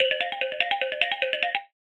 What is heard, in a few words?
wood ringtone, sms; iphone x